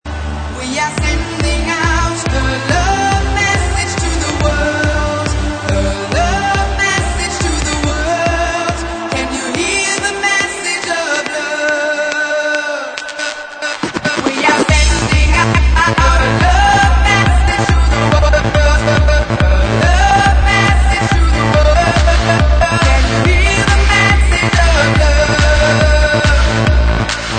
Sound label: remix